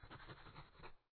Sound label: Write pencil; papas cupcakeria online windows gamerip 2013